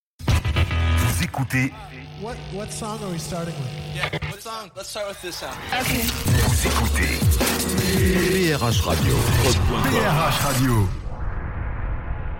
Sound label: Radio Sweeps Rock